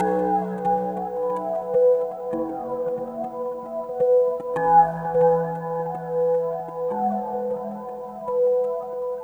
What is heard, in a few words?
Atmospherikl; Sonic Foundry (Sony Creative Software); Synchro Funk